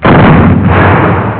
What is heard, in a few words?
PLAYER DIES SOUND; Escape! (2.1)